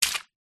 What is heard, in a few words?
household mop wet on tiled floor single splat; zapsplat